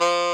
SAX XSAX, Saxophones; All Saxes

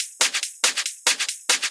Loops 140 BPM Hihat Percussion Loops

